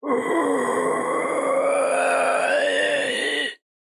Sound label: Scary Voice; soundscrate